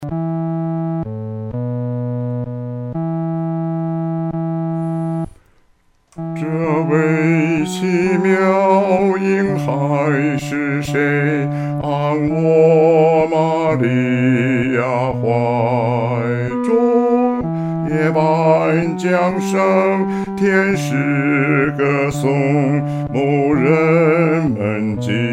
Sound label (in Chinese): 独唱 第四声